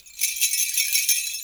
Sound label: TANZNIABELL; Spectrasonics; Heart of Africa 1